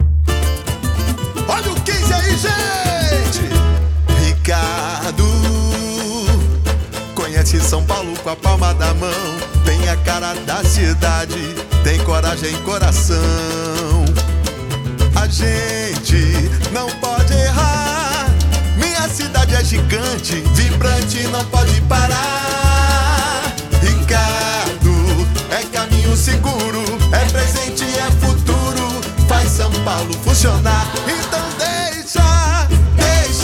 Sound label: Jingle Samba